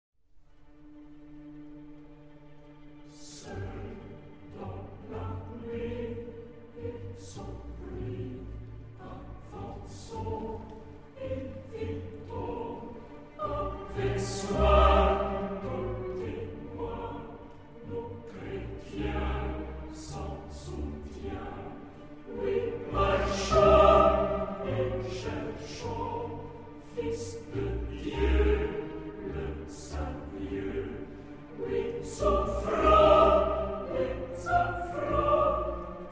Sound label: Radio Choir